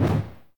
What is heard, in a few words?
hammer; kult